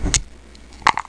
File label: DICE UP; Board Games for 2